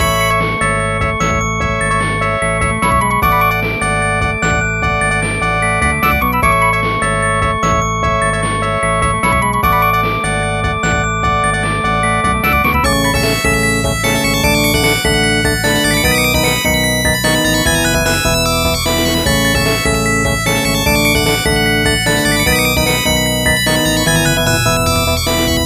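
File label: background music; Mega Twins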